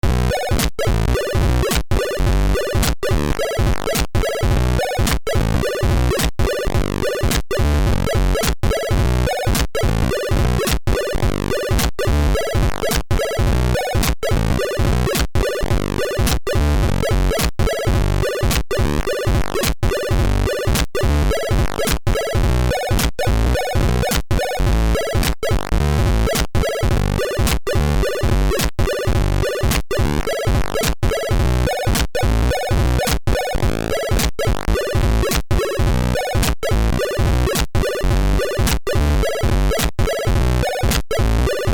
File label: music